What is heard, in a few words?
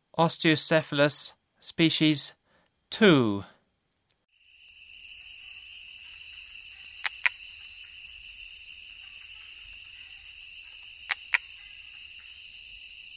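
Osteocephalus species, Frogs of Ecuadorian Amazon; S. America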